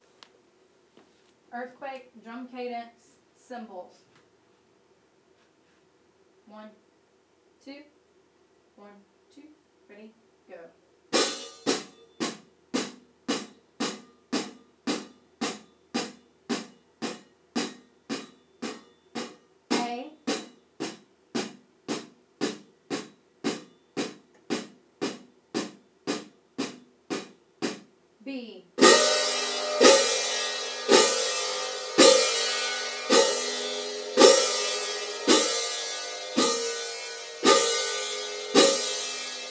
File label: drum cadence cymbals; earthquake